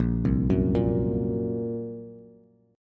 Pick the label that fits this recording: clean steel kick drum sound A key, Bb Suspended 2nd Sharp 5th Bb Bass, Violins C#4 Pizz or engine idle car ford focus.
Bb Suspended 2nd Sharp 5th Bb Bass